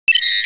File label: Scroll Page; Blind Yoda Sound Scheme; star trek sound pac